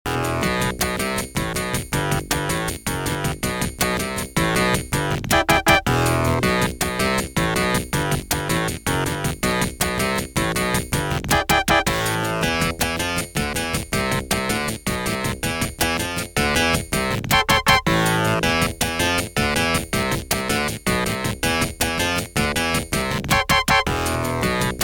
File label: adlib; doom e3m1